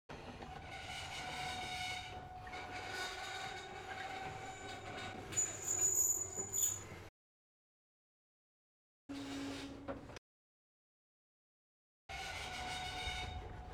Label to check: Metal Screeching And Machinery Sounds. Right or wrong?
Right